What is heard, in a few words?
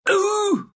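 pain, Russian; Dota 2; Gyro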